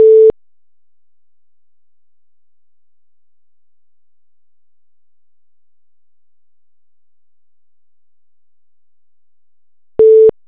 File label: call wait